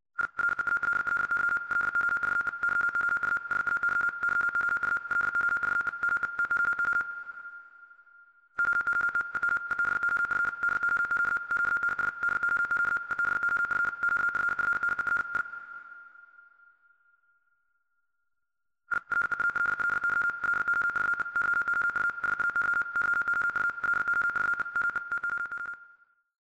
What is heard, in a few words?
Morse